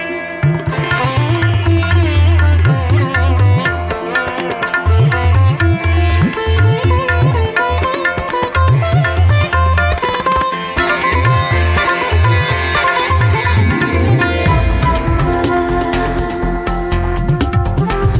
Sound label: Syahdu India; meloboom